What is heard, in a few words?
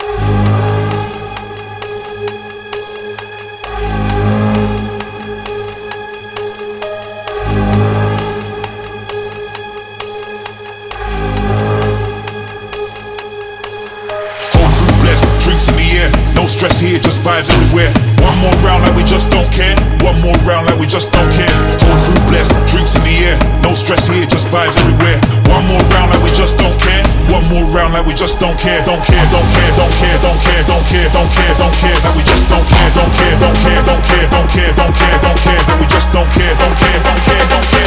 Construction Kit Full Mix; sickrate siik essentials iv